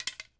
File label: Arrow Quiver; Civ4 warlords